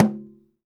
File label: GENDANG E6, GENDANG DRUM; Spectrasonics; Heart of Asia